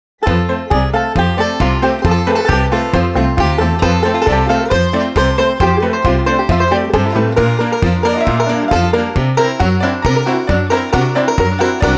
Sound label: banjo, 2 banjos 10 strings; I My Me! Strawberry Egg